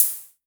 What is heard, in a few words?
Hi Hats Open; Drums; LIMAT Div Dept